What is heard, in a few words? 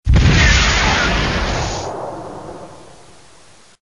RPG Fire